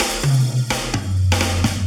Fill Ins 128BPM; Vengeance Samples

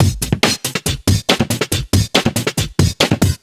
Breakbeat 140 BPM

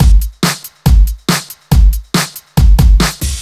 Loops BB 140, BreakBeats; Vengeance Samples

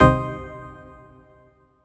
Synth Cuts; Vengeance Samples; VES1 Instruments

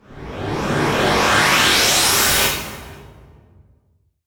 Uplifter

